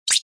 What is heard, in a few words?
rollover